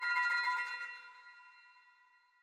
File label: shimmer; Léonie Adams